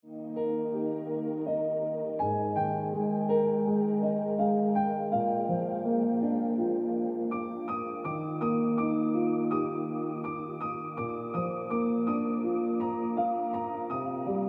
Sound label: Ringtone Piano Music